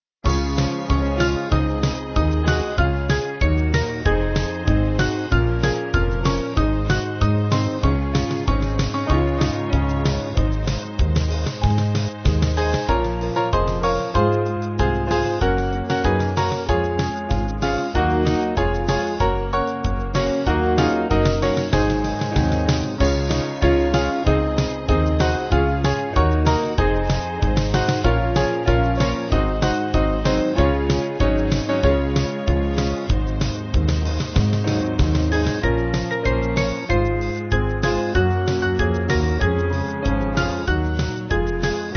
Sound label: Band